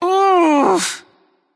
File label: "ugh"; smite; yemoja